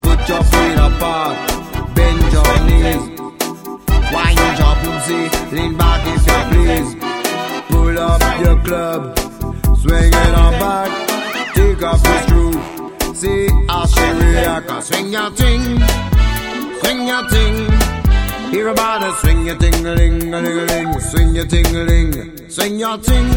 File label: swing